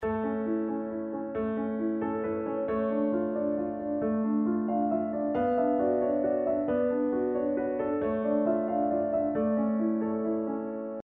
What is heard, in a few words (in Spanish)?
mayor 12 8